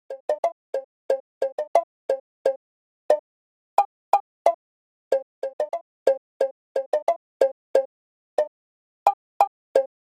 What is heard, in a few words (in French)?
Monocorde; Petit Escargot